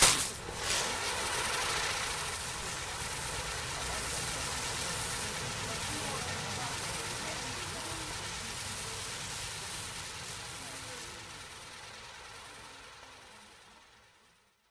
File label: spin, firework; CosmicRage